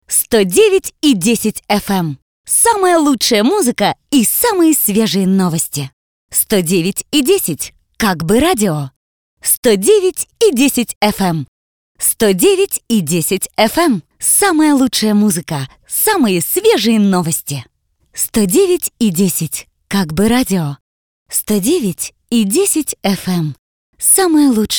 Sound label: Jingle